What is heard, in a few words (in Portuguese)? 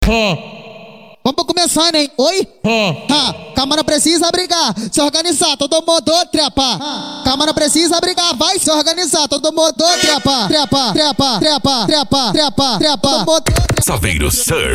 funk consciente e funk ritmado